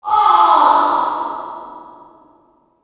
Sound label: inmate agony